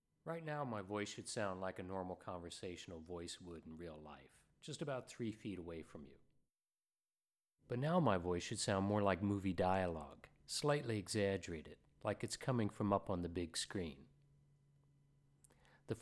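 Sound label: 20dB level Check; UCLA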